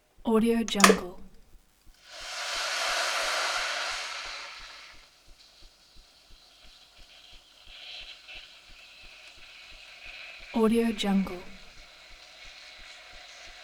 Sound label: boiling water with an electric kettle until bubbling